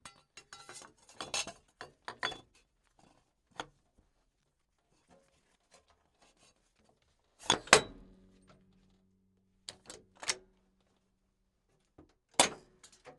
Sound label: infanterikanonvagn 103 firing pedal; Sonniss GDC